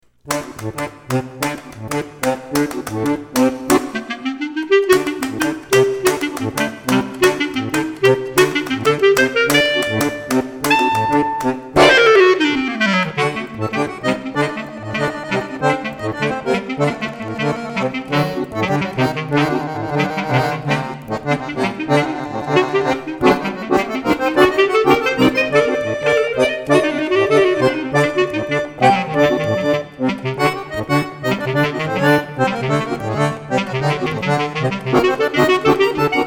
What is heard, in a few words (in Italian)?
danza macedone, accordion and clarinet